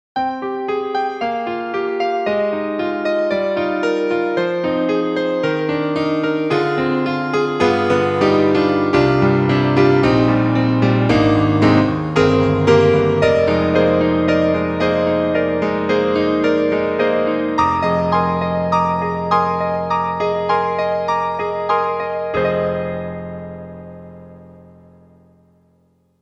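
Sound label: KAWAI GMega, piano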